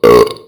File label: burp; midnight guns